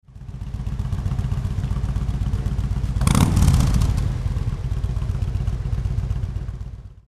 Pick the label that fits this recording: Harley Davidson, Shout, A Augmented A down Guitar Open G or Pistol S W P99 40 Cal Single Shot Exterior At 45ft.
Harley Davidson